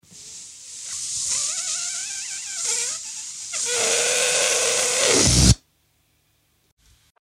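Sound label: balloon deflates through a small hole with a pop at the end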